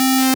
Synths Root C

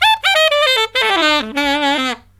SAX, Killer Brass Riffs

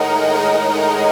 Atonal Pad, Keys, Pads

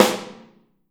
SN OH L, Kit OH Mic, Drums Percussion

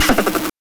VOX LAUGH; NES DPCM; MIDINES

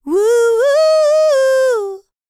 LICKS, Vocal Planet R&B Dance; Spectrasonics; LT R+B

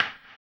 HAMBONE; ILIO; Vocal Planet; Blues & Soul

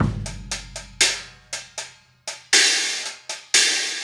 Cymbal and Kick

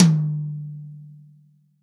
REAL DRUMS POP KIT

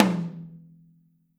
Drums Percussion, OH Mic

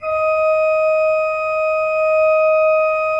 Cathedral Organ D4; Propeller Island

